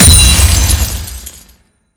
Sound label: gold acquisition; LoL